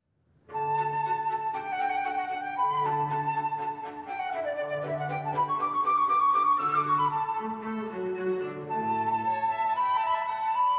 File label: Flute Quartet in D; Mozart